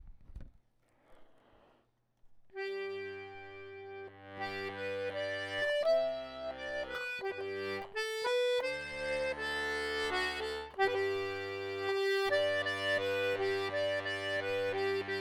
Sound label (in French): Adieux (travail des basses)